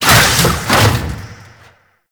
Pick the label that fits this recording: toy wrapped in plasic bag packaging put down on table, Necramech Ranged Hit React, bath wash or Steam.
Necramech Ranged Hit React